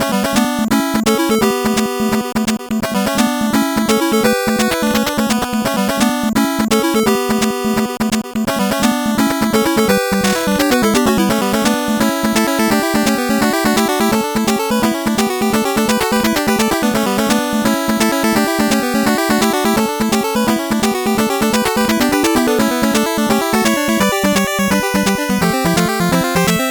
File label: 8bit bgm normal